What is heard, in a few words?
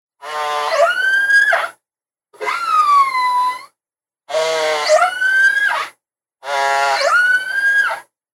killer whale